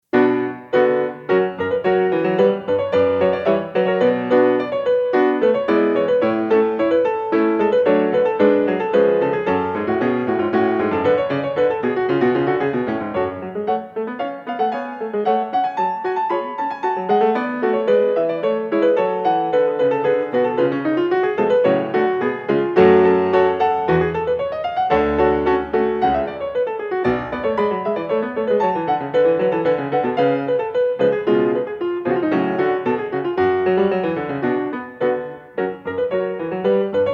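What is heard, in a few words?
Overture in the French Style - Echo, piano; Bach; BWV831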